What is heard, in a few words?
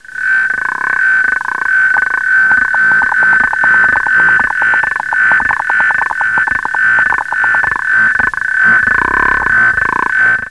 pair of frogs